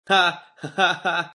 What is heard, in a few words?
laugh